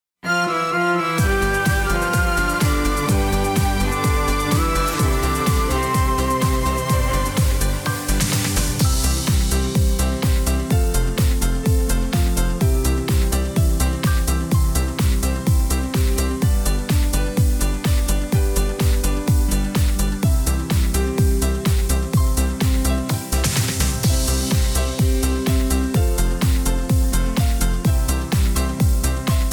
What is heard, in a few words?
balalajka rhythem, square dance music